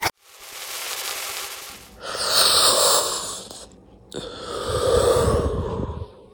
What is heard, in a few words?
Woman Smoking